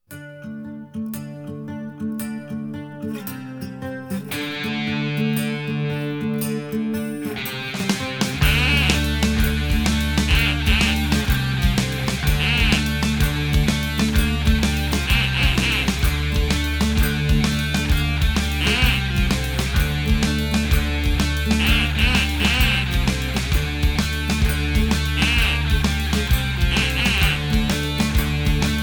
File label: duck off